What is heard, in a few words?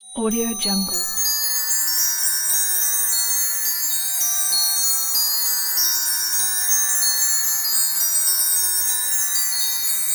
scifi chaotic bells; soundcreator